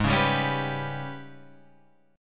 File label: G sharp Minor Major 7th Double Flat 5th